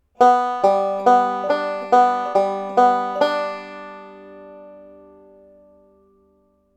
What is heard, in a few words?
alternating index roll